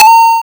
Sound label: win; GeoJam2021